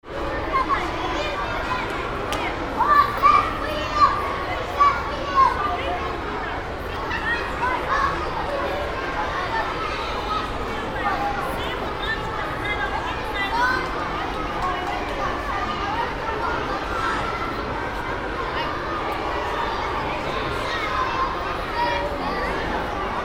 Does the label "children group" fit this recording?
Yes